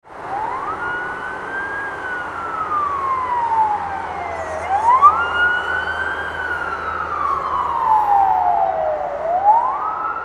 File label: ambulance passing with siren